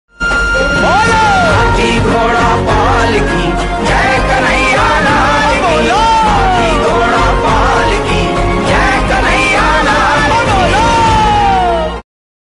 hathi ghoda pal ki jai kanhaiya lal ki devotional bhakti hindi

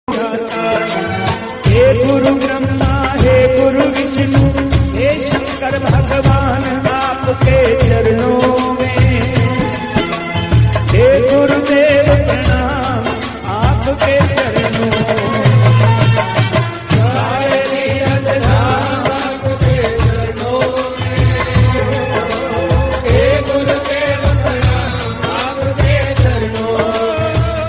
hey guru dev parnam aapke charno mein devotional hindi

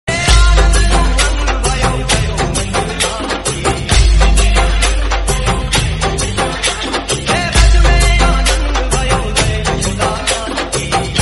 hathi ghoda palki jai kanhaiya lal ki devotional dj remix

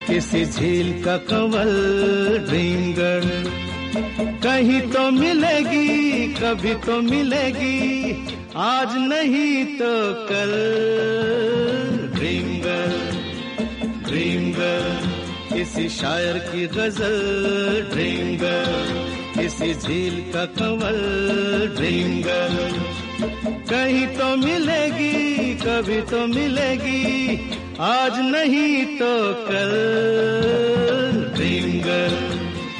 hindi

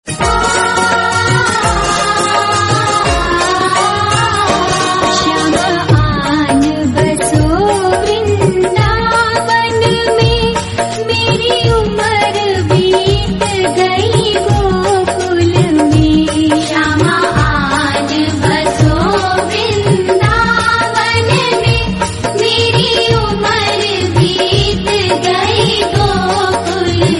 bhakti, hindi

